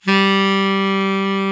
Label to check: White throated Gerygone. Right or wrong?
Wrong